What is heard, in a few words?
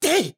Attack